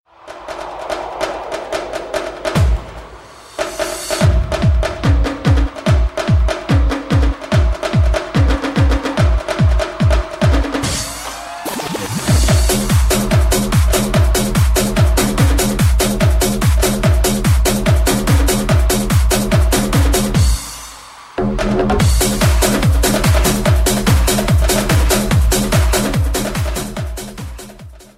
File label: Cool Beat